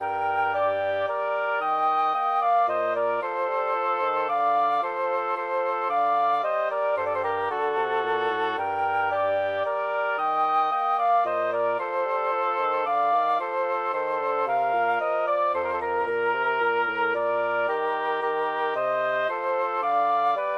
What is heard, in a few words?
for woodwind trio; purcell henry; shepherd leave decoying